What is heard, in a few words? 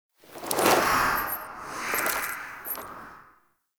Protoframe Room Inactive To Active Idle, Ambience, Lab; Warframe; Tau